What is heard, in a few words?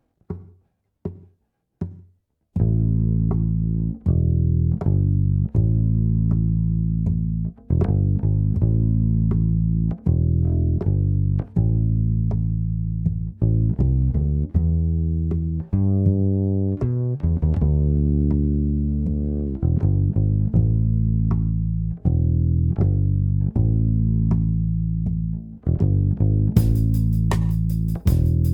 drum and bass